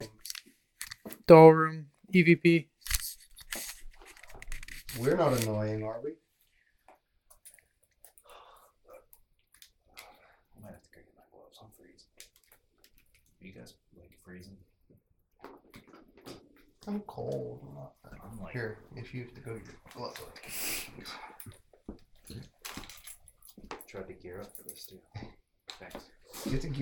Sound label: Doll Room EVP